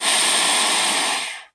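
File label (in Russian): затаенное шипение змеи в лесной норе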